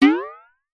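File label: sounds of metal cable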